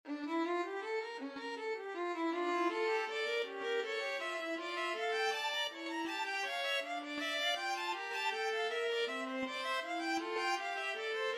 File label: violin viola duet